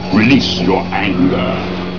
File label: anger